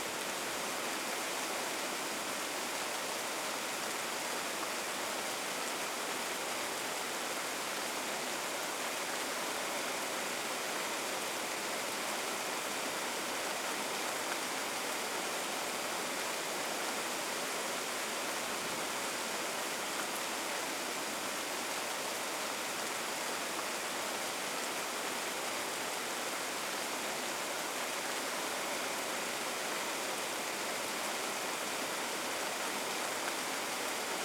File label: Rain Strong Loop; Survival Game Sounds - Lite Edition; Environment